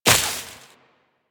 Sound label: Step Veg, Footsteps Walk; Warframe; Tenno